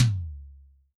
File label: Tom, drum